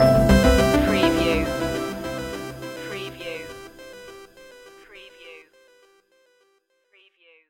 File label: first class stinger, Short music s